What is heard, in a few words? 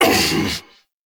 Pain Grunt; Stalker; Warframe; Jade Shadows